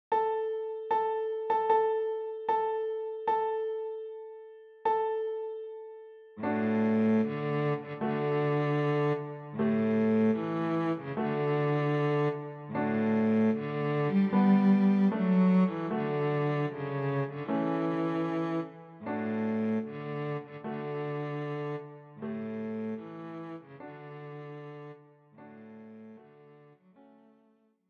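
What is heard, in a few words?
cello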